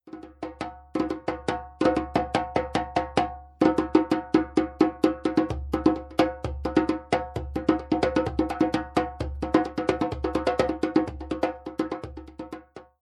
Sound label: djembe